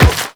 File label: Run Metal, Footsteps